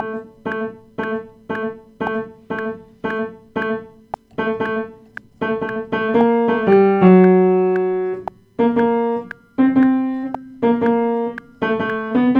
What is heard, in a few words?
alto practice, piano practice; christmas